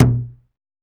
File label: UGANDA DRM, UGANDAN; Spectrasonics; Heart of Africa 1